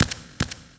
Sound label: Archer Run; Marlone; Gamigo